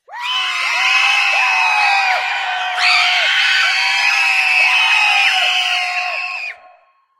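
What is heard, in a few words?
sounds with scream of burning person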